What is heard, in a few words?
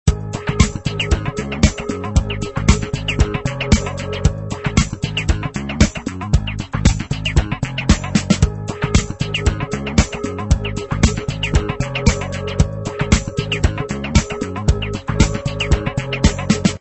loop; titans; beast boy